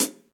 Hihat Closed Classic Muffle; Drum Hits; Session Drums Studio; MPE Hits

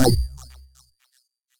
Zap, Retro Synths; Ableton; Factory Packs; Opus

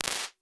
Gated Water Snare; Made for Drums; Ableton; Convolution Reverb

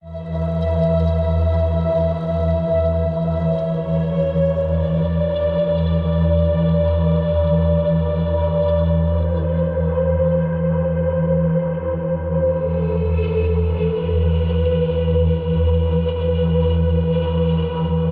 Sad Pad - Emin 106 bpm; MIDI Clips; Tonal; Atmospheric; Ableton; Factory Packs; Mood Reel

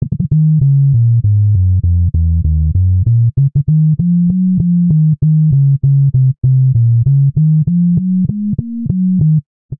Bass Eb Minor 98 bpm, Bassline; MIDI Clips; Tonal; Beat That; Ableton; Factory Packs; Chop and Swing

